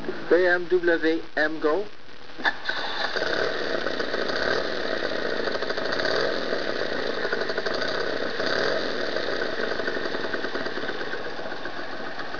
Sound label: bmw; emgo